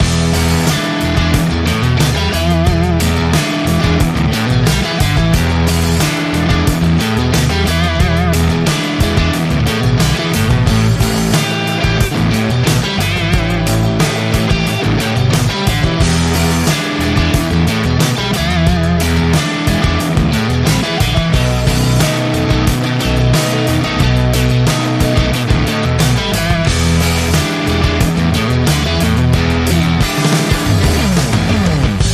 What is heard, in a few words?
Classic Rock Revival, Rhythm